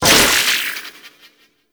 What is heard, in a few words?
Arcane Generic Proc; Warframe; Mods